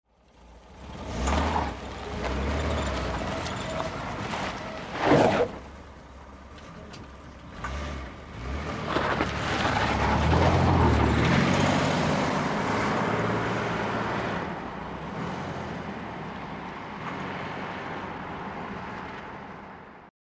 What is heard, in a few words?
Chevy Corvair Quick R